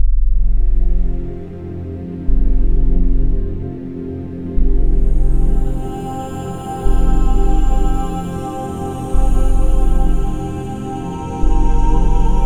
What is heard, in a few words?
End Match